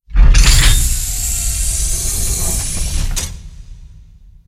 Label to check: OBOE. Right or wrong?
Wrong